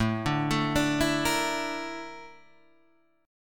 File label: Bb Major Sharp 11th A, chord